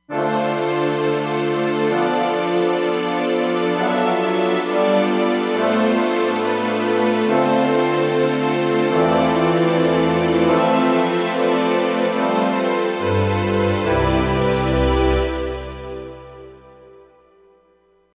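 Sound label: Hollywood Brass, Roland Fantom XR; HollywdBrass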